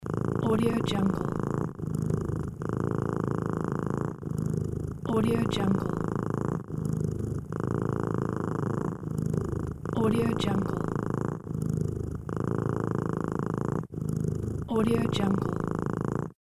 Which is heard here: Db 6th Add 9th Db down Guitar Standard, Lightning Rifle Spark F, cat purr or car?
cat purr